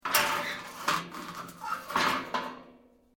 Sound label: Metal plates scrape; Materials; Foundation